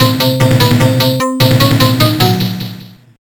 ditty; sc2; mmrnmhrm

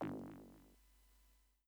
shield; yehat

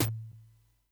boom large

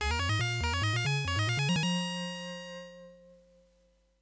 ditty; shofixti

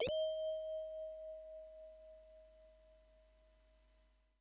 missile; mmrnmhrm

